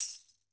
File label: Tambourine Hit; 090 Emin; Hip Hop Exotica; Apple Loops; Big Fish Audio